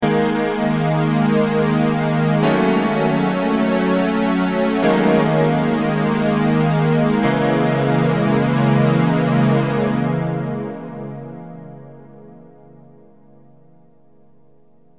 m1000 sbf325

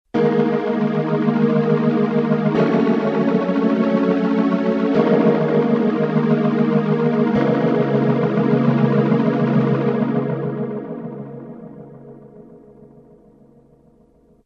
m1000 cls222

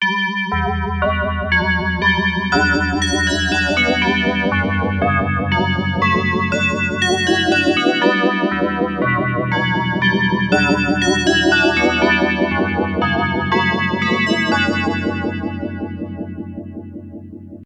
DX7 bells, pigtronix

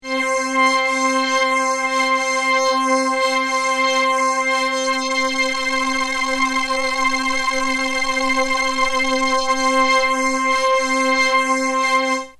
voce spin

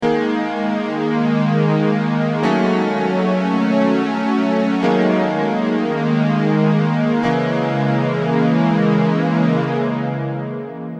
Rockman stereo chorus

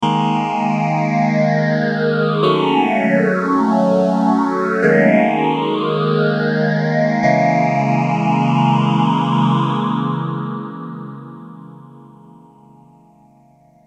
m1000 ibanez ph7

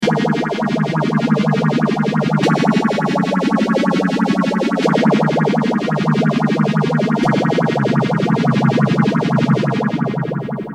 phaser

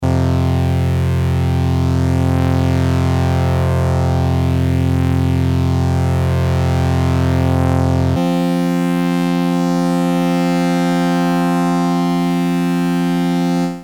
prodigy voce spin

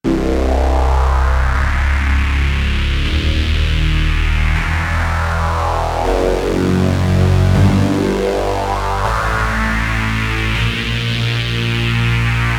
octascale pigtronix

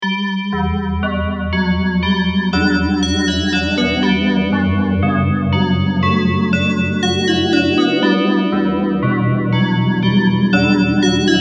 DX7 bells phase

